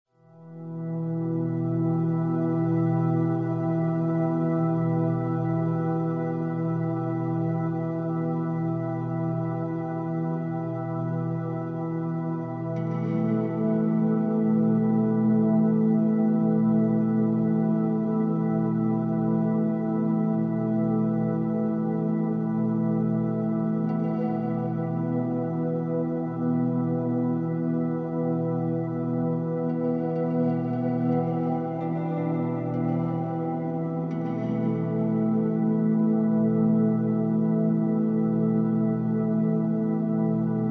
Amb Sound Scape Ethnic Mandolin, Soft Ambience, Mysterious; Ambient SoundScapes